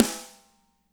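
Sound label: snare right; Spectrasonics; Burning Grooves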